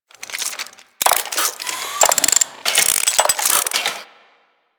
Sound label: Pet Wake Up Foley; Cinematic